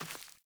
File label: Sandals Run Vegetation, Footsteps; Characters; Player